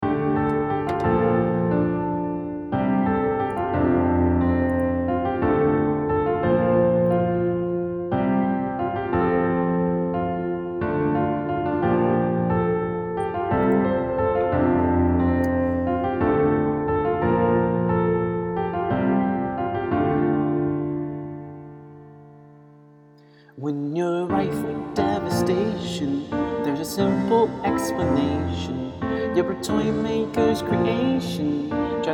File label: Gypsy Bard Song (Piano Cover)